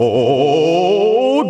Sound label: "oooooohhhh", voice; ooh ahh